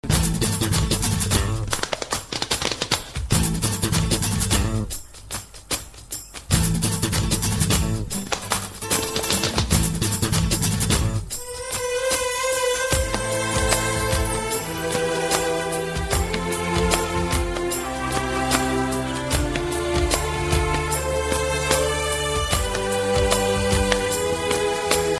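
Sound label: spanish guitar violin ringtone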